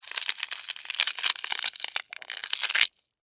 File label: Larva, Egg, Hatch, Crunchy, Birth, Insect, Bug; Sound Effects:Animals:Insects; Blastwave